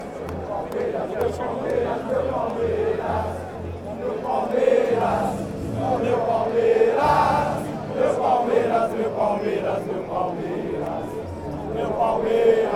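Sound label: fanchants, football chants; e palmeiras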